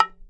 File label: arabic perc, Arabic Percussion Kit